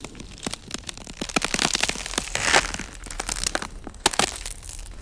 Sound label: sharp ice cracking and splintering